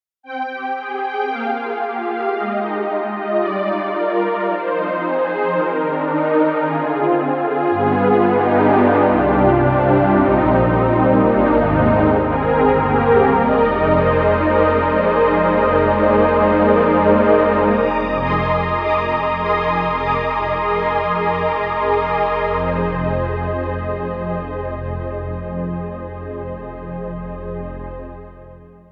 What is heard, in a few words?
Synth, Solina Pad; Synthogy